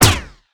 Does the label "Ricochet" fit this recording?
Yes